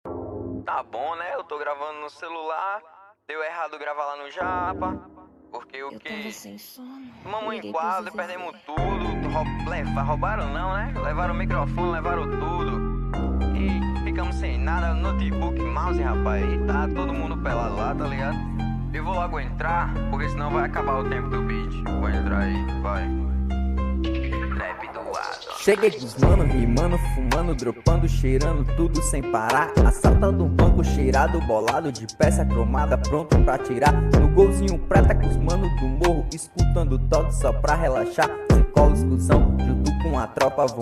beat

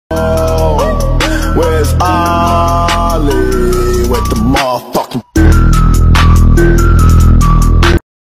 flute

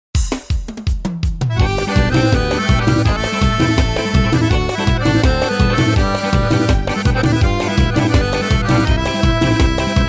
forró

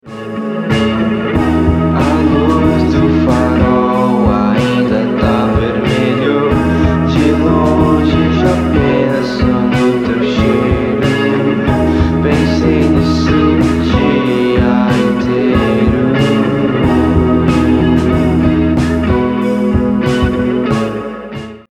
indie rock

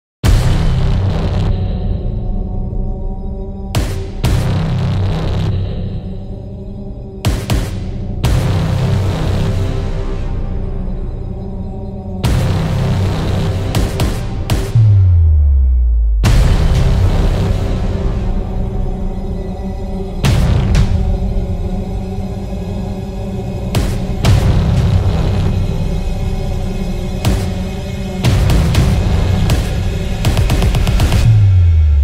creepy hybrid horror trailer music

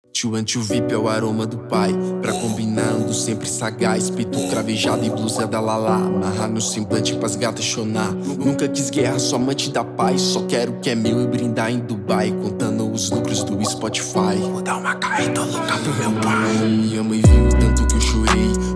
rap trap beat

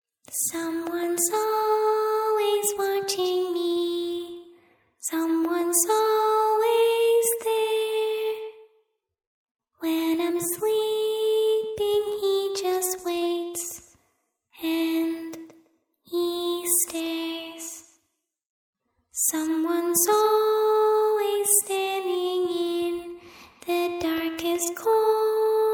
creepy little girl singing horror sound

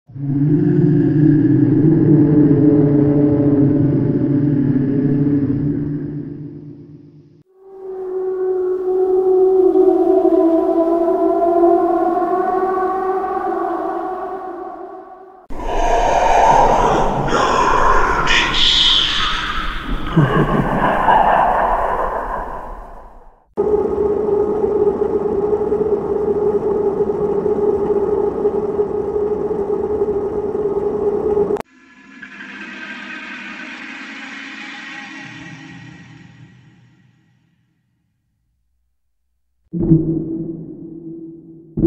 horror scary sounds creepy sounds

